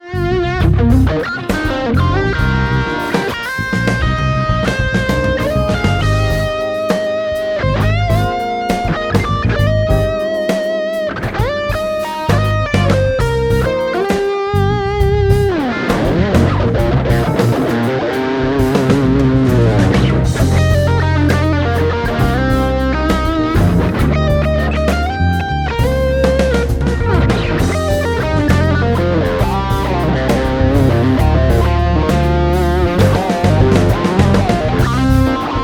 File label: fusion shuffle